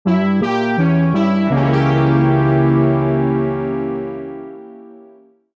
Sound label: Jingle Lose; Puzzle & Dragons Gold